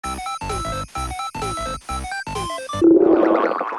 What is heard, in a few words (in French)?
sonnerie